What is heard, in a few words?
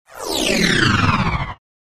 Descend Pulsating Static